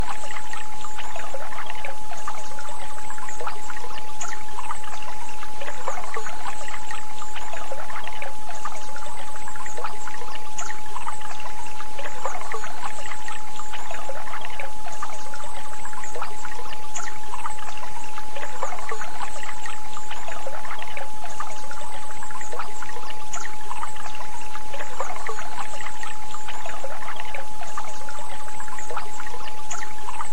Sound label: Return to spiritual order with stream sounds